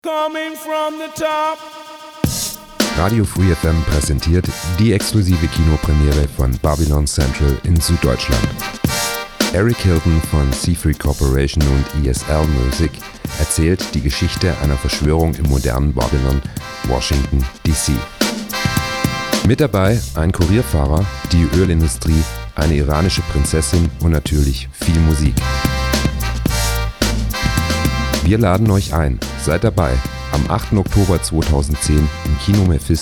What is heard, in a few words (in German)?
jingel; babylon central